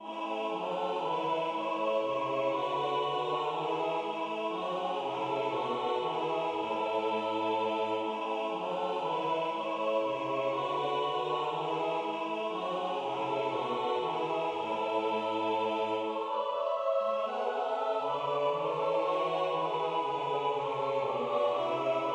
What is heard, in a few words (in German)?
Bass; Maienfahrt